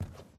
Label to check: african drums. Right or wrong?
Wrong